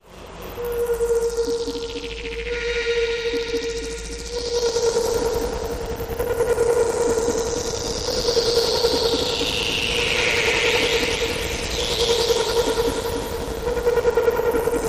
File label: Message From Beyond Music Pad